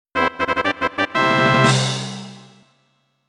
Correct Fanfare; Banjo-Kazooie

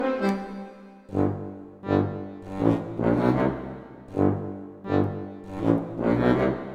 Music Transition; Plants vs. Zombies Battle for Neighborville; GnomeGnight

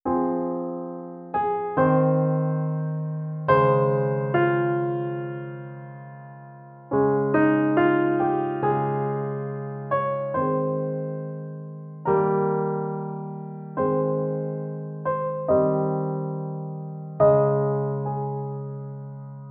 Piano version; Moshimo Ashita ga Hare Naraba

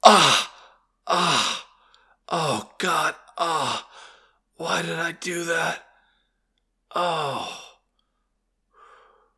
Voices ahh; The Looker; telescope

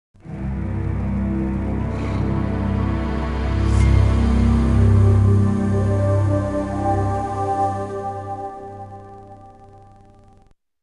Logo; Iguana Entertainment

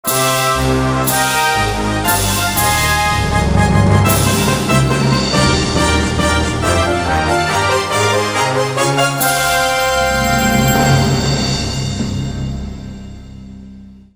Complete; Kuusen

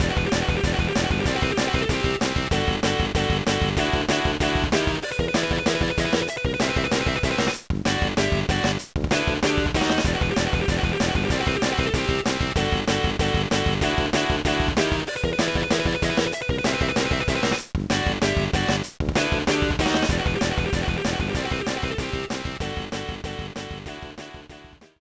BGM, gba; Backyard Baseball 2006

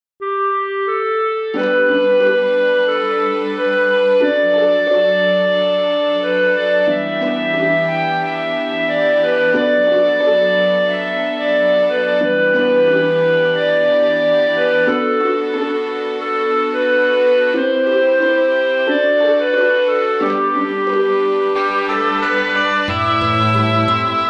Calm Forest; record of agarest war; イベント エンディング

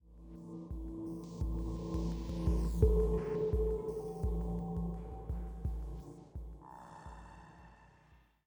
Military High 85bpm

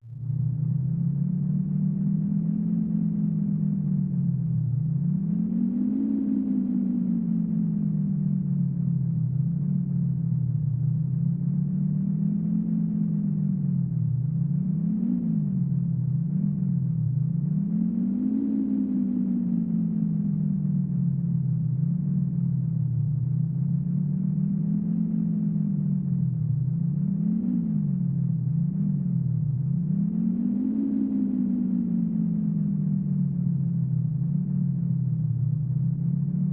Wind; Sound Novel Tsukuru; SNES

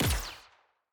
Lowered Sciweap Shot Small Gun Bullet Snapper

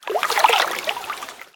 lake; CS Level2

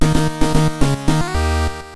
Level Start; Gobunbun; Android

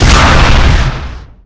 Weapons Rocket Launcher

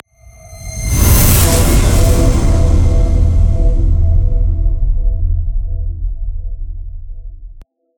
Transition End; Everspace 2

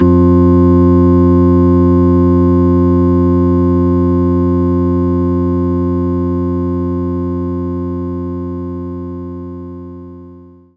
Hammond Organ

